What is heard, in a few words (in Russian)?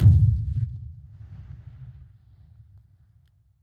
tihij vzriv vdaleke